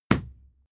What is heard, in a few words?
fight impact sweetener cloth muffled; krieg-waffen; nahkampf; soundpool